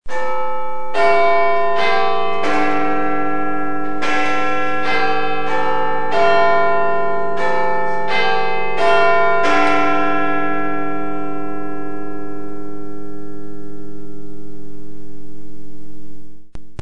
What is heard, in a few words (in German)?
Big Ben Dreiviertelstundenschlag